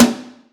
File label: Toms, Real